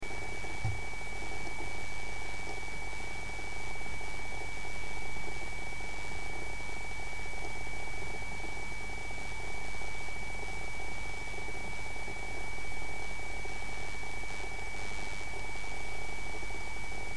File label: hitachi deskstar 7k250; hardware